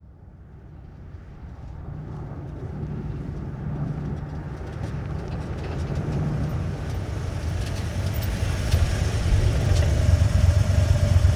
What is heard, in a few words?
1947 Ford V8 Truck Ext In Slow Idle Off; Period Vehicles